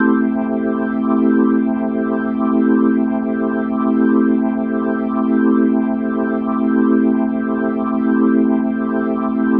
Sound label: Organ A Min7; The Vitale Organ; Minor 7